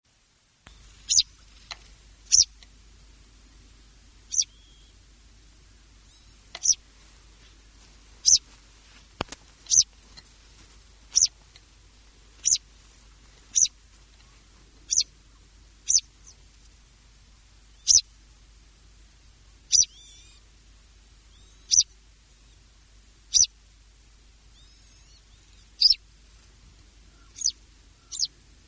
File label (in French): chant